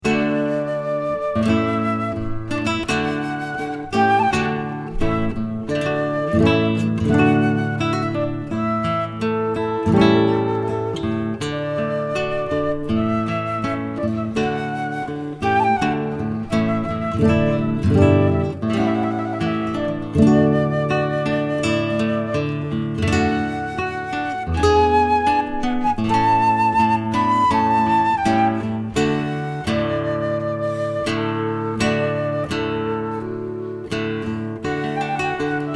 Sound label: Trumpet Voluntary